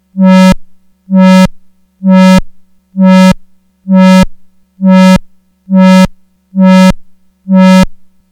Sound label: vcf ar slow attack fast decay low res; analog synth 101